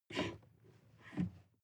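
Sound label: sports car interior pedal brake pump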